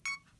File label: Insta360 Recording Start